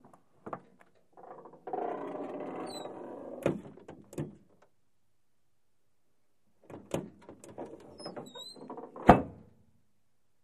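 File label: Buick, Trunk Open and Close close up, Squeaky, X2, antiques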